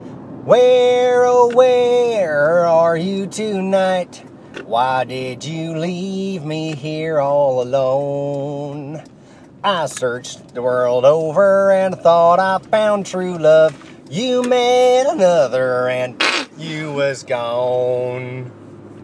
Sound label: hee haw